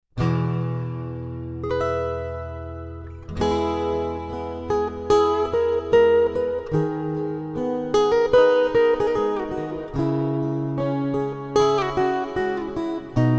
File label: Acoustic